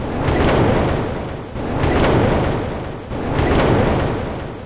fan; fans; Source Engine; bshift

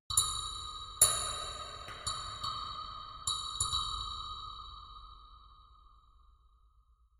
ambient synth grain click

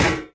physics turret gibs

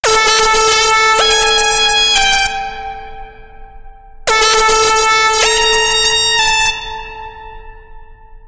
horde danger; music; Left 4 Dead 2; Source Engine; zombat

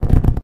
rubber tire strain; physics; Source Engine; cstrike

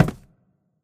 phys cube, physics

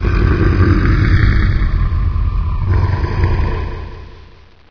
pain; Source Engine; bshift

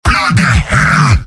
demoman negative vocalization; mvm

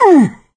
hurt major; voice; source engine; left 4 dead 2; survivor

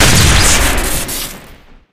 sniper railgun single crit; tf

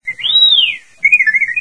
r2d2; video games; Source Engine; deathrun starwars

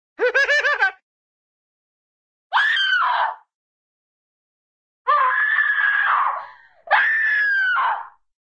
laugh, cartoon short crazy laugh

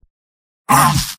pain sharp; spy; video games; source engine; tf; mvm

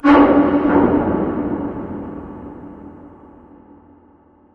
metal scrape verb

